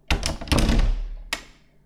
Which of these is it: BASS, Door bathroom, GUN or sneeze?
Door bathroom